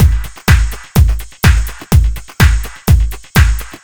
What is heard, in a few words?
125 Full, Drum Loops 125bpm; Nu Dance Electro